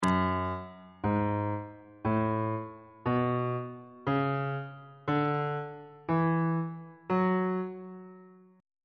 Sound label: f sharp aeolian mode